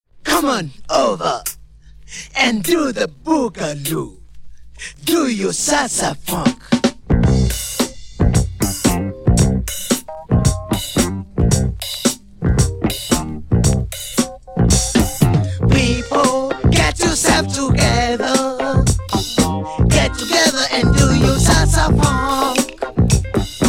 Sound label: Funk